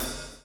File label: CHAIN; Spectrasonics - Backbeat